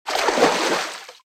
Footsteps - Water - Splash; RadwinIsland

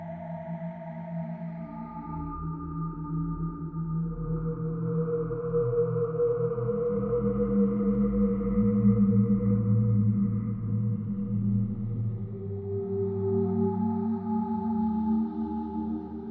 Witch Pad; Inquisitor 2

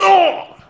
Hit; Blind Quest - The Frost Demon

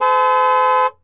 Truck Durango Horn; Trucks

